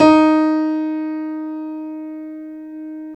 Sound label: Piano Grand; CMI